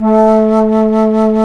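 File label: alto flute, vibrato; Roland L-CDX-03 Disk 1; A.Flt vib 1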